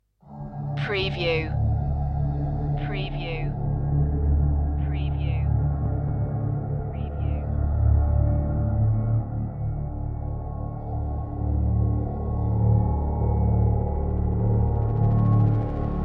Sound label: creepy hollow drone